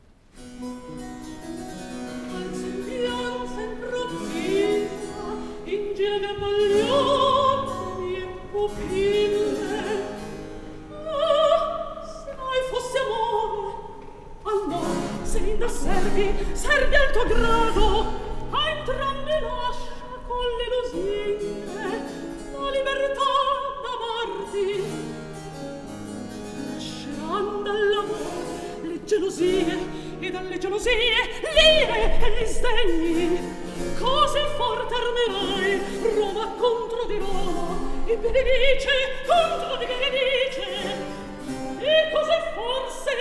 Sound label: REC.; Atto I - Scena IX; Selinda; Qual sembianza improvvisa; Antonio Vivaldi (1678 - 1741); Vivaldi - Farnace (2009)